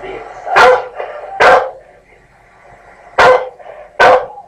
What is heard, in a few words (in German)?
bellen